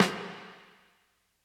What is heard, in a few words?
80s reverb snare